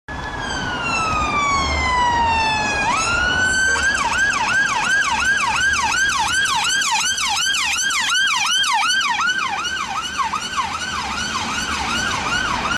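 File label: British ambulance siren; uk ambulance siren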